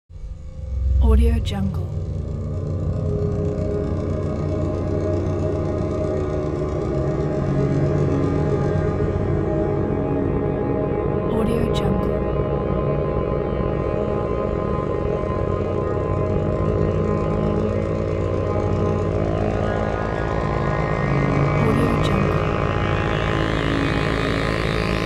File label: scary horror creepy ambient